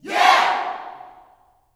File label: "Yeah" yell shout, gospel; Spectrasonics; Vocal Planet Vol.1 Gospel; akai